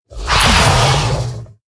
Battlefiend Attack

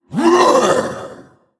Male Skeleton Attack

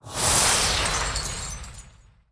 Magic Crystal Underlay Attack

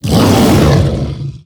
Attack

